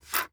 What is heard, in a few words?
Foley Coins Sliding On Wood Table Quick